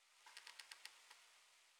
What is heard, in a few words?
wood strain, Night; FontainebleauDemo